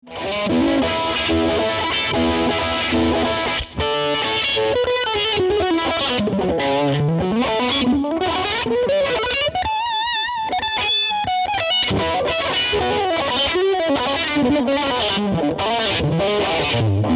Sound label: eq tremolo